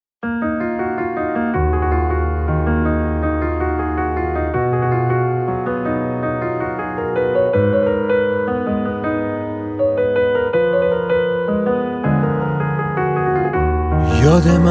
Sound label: Piano Version